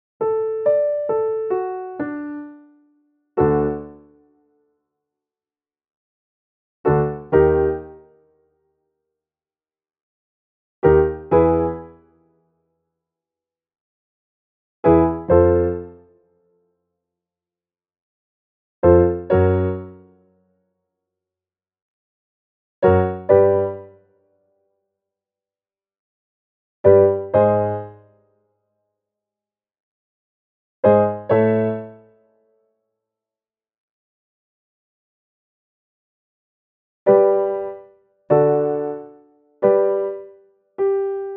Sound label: audition accompaniment track